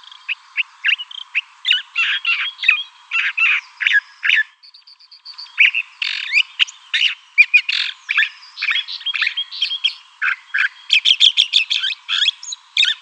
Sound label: Thrasher song